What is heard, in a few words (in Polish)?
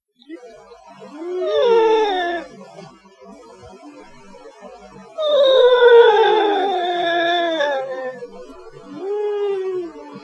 zbik